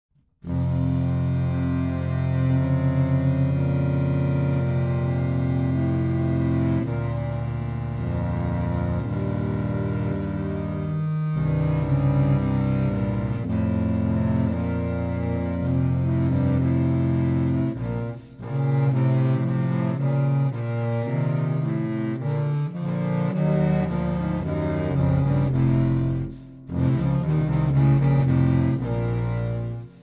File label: on 3 Eppelsheim contrabass saxophones